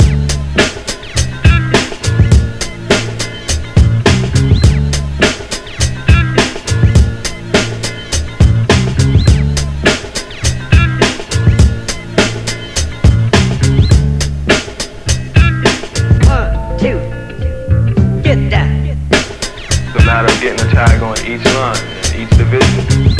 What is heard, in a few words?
funk ride